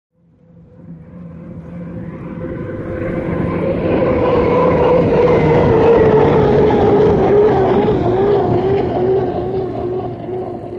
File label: Hydroplane By Splas